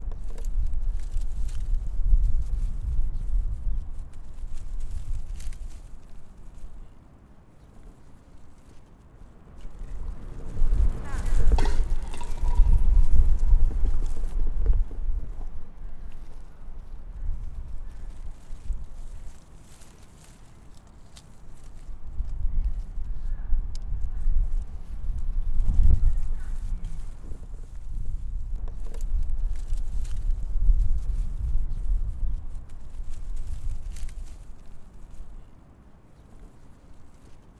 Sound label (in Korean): 바람